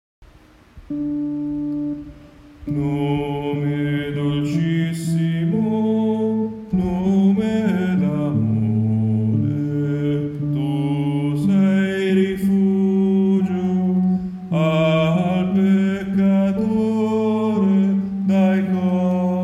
Nome Dolcissimo Uomini

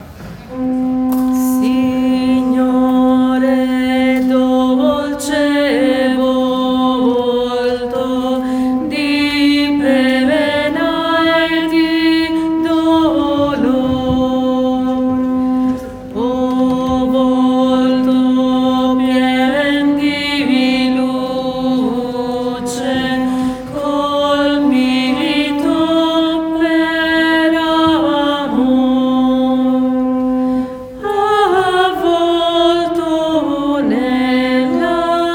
Signore Dolce Volto Contralti

